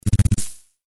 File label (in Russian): Razryadka